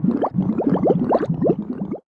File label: Poison